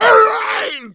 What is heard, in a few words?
hunter stunned; pain